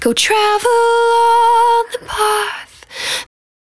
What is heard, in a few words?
110bpm Ab, Velvet Vocal; Zero-G